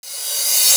Reverse Cymbal

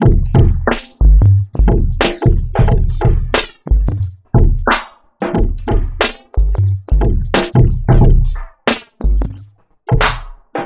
a mixed bag 090bpm, Downbeat Dance Grooves; Zero-G

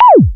PERC FX

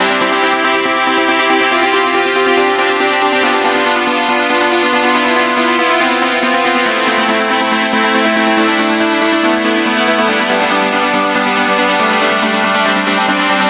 NRG Pad

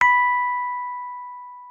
MKFF B5, RHODES; RHODES A; Xsample VOL-1

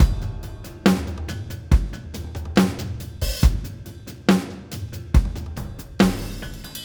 SloMo Beats, Loops 140 BPM

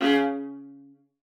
MART C#3, VIOLA SPICC; Xsample VOL-5; 90 s Sample CDs

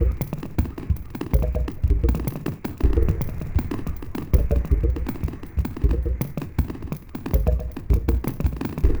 Glitched, Processed Percussion

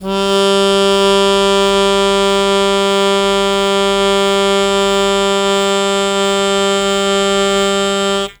Long Sustain Aa G0, Harmonica; Zero G - Harmonica

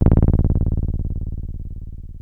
303 C1, TB 303

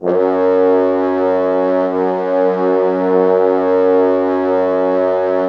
Orchestral Brass

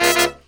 C# BRASS; E-MU Formula 4000 Series Vol. 1; Hip Hop Nation

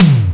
tom, Linn Drum LM1

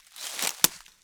Crunchy Step, outdoor, Footsteps

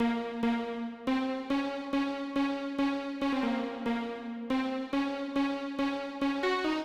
Orchestral String Riff, 140bpm; Zero-G Sound Sense Grime Scene; GS Construction Kits; Its Primetime; 90 s Sample CDs

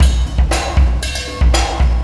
Beat, Processed Drumkits

